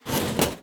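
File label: Mines Flip Platform Flip Back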